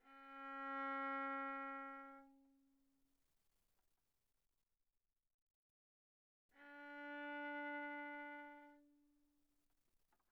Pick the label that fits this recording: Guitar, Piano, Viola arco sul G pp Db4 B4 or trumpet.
Viola arco sul G pp Db4 B4